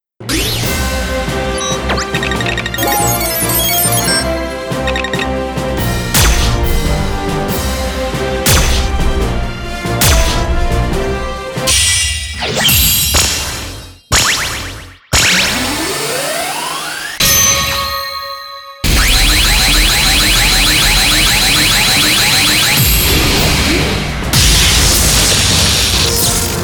遊技機 リーチ 効果音

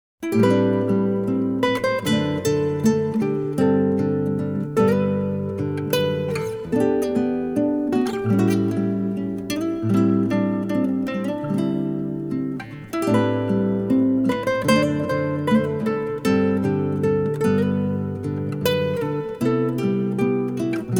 夜のシーン BGM

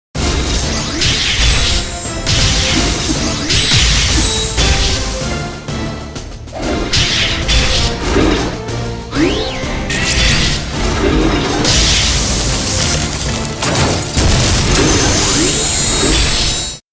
ロボット バトル シーン 効果音

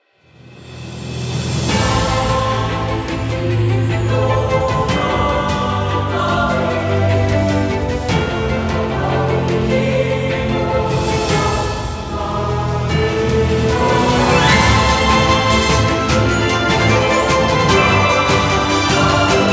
オーケストラ BGM

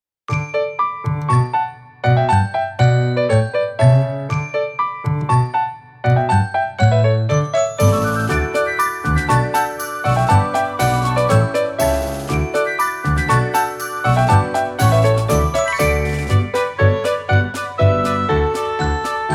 日常シーン BGM

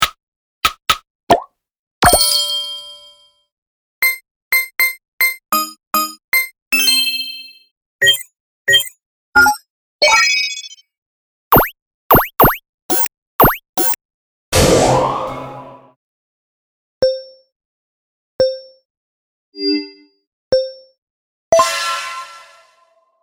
ゲーム用システム効果音

